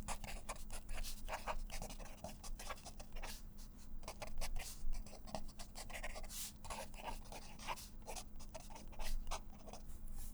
Fine Felt Writing, paper, writing; bb - Books, Paper, Writing

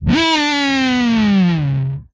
Falling, Vocal

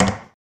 wood close; wood metal SFX

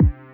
Solid Kick, Drums; BB 2HTC Samples Vol 4 Addendum

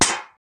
metal sheet; wood metal SFX

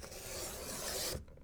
Cut Paper, Paper Cutter; Micro Pack

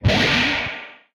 Explosion, retro arcade; MMRetroArcadeSoundsPack

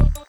Bass; Weird

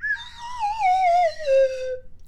Wobble Drop, slide whistle

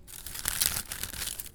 Crinkle Plastic; BB Retail Therapy Sample Pack

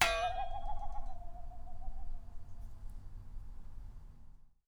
Wobble, Japanese Pull Saw

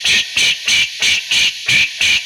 Train Loop, Vehicles; retro arcade

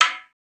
wood hit; wood metal SFX

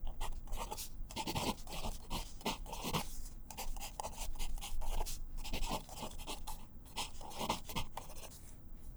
Mech Pencil Writing; bb - Books, Paper, Writing

